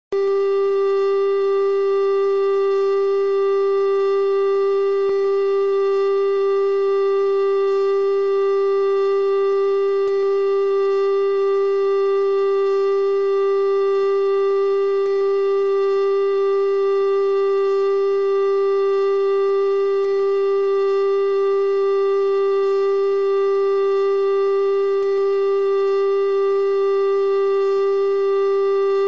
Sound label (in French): Sirene de fin PPMS officiel